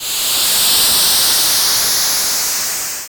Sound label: Explosion